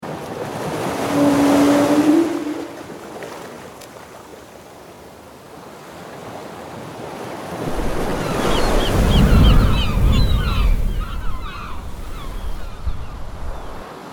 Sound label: Whale Noises